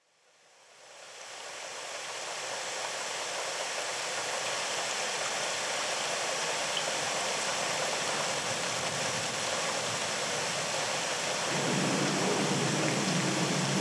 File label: Relaxing Rainstorm; RelaxRelax Toolkit; Health Promotion and Wellness; Psychological and Emotional Well-Being